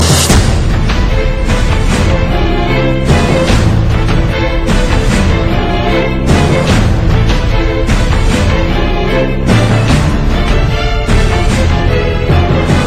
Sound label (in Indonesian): Nada Dering Anime; Bleach